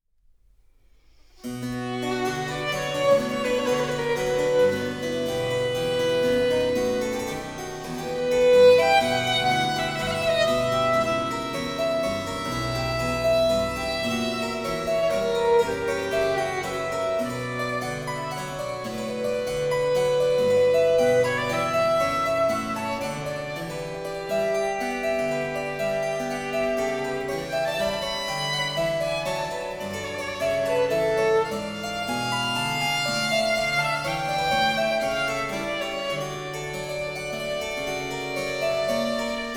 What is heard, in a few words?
Sonata No. 4 in C Minor, Largo, Violin and Harpsichord; BWV 1017; Bach; J.S. Bach